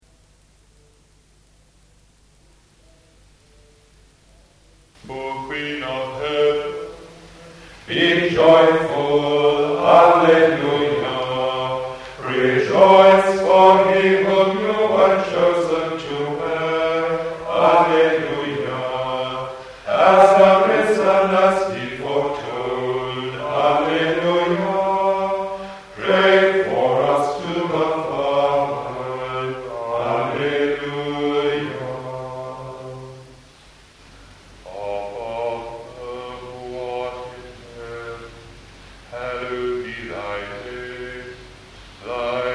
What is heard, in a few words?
Monks singing Salve Regina and Pater Noster